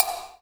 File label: BELL JPN, JAPANESE PERCUSSION; AKAI S6000 CD-ROM - Volume 5; Ethic